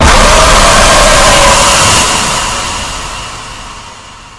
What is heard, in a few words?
jumpscare sound loud